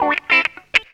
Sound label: GTR, GTR RIFFS, Funky Elements; Zero-G